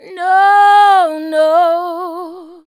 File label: VOX, Singin; Sound & Vision; Gigapack II CD 2 (Roland)